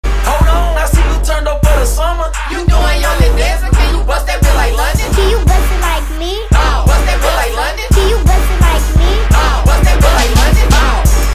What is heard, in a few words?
"bow bow"